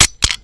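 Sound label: stone; Mafie 1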